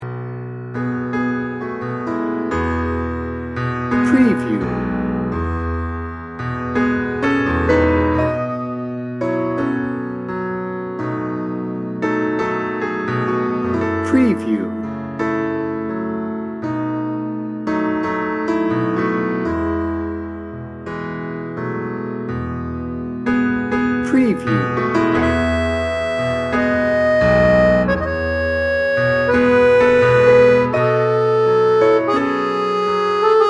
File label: sexy tango loop